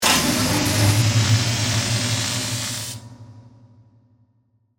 Power Up Mechanical, Electrical, Machine, Charge, Up, Sequence; Science, Fiction; Spacecrafts